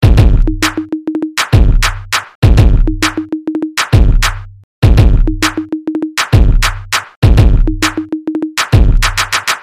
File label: beat